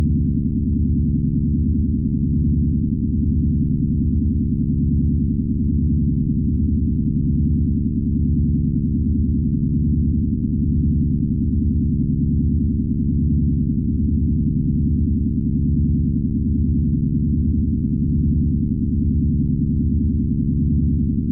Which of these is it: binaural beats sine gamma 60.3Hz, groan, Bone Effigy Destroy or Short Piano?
binaural beats sine gamma 60.3Hz